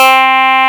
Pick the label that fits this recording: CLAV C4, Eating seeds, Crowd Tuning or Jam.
CLAV C4